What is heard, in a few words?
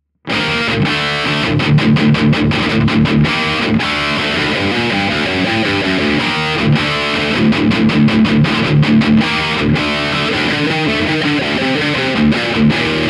characteristic sound; jensentone